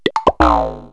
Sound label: bong pops, cartoon accents; KABOOM! - Wild and Wacky Sound Software